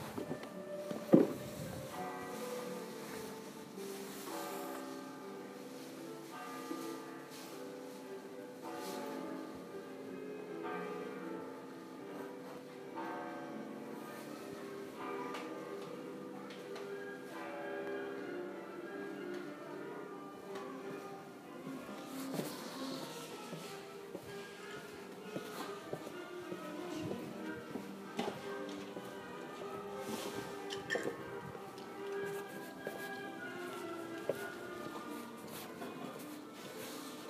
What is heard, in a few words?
Restaurant Sounds: Kotor, Montenegro